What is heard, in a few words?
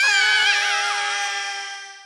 wheel start effect; Fruit Fiesta; Wazdan